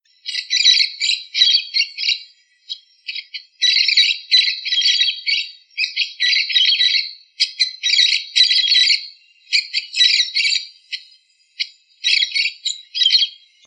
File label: Pileated parrot